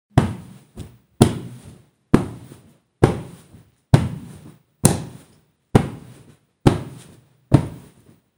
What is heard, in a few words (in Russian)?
zvuk podushki